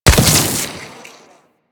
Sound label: Adhesive Fire; Enemies; Abilities; Warframe; Corpus; Arachnoid Hunger